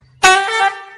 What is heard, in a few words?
Truck Horn